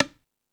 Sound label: Latin Drums, E-MU Pro-Cussion